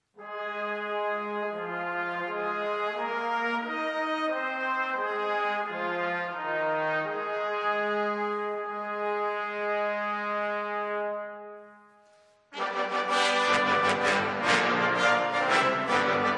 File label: hymnus